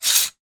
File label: industrial metal caulking gun mechanism slide out